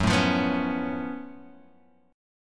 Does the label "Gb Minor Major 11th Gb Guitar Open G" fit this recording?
Yes